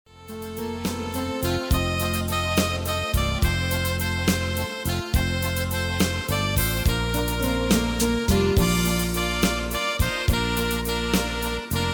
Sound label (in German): Marsch Walzer